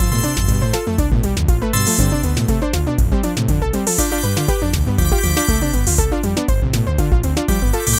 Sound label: Electro